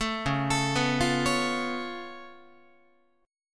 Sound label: C sharp Augmented 7th C sharp down Guitar, chord; arpeggiated; JGuitar